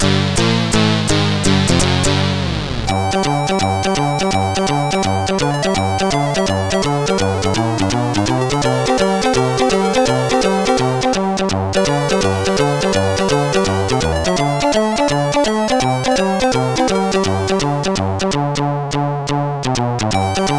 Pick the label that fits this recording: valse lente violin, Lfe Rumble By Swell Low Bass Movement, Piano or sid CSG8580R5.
sid CSG8580R5